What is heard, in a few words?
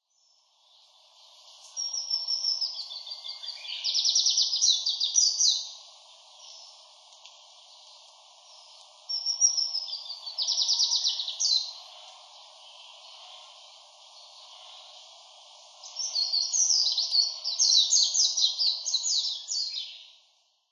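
Japanese white-eye, utsunomiya; mejiro; koe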